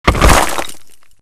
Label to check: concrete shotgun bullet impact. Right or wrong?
Right